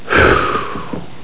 whew

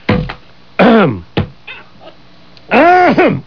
ahem

